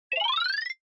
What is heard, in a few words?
Motion Slide Fast